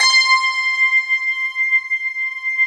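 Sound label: harp harmonium C5; HARPONIUM; Synth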